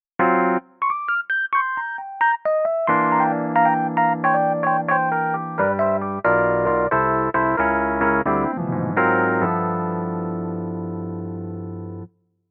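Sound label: Studio Rhodes; Classic Keys ROM Option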